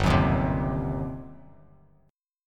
G Major 7th Suspended 4th Sharp 5th, G, down, Bass Standard; GM7sus4#5 strummed